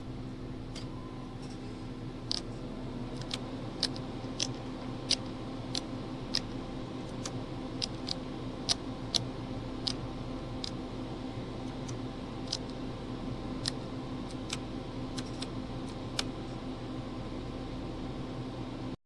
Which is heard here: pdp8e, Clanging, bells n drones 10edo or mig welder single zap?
pdp8e